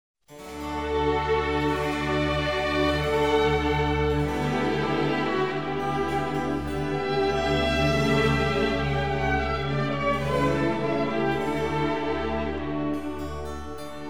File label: Flute Concerto In D minor; Bach